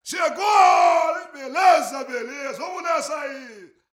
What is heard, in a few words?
BRAZIL, World Voices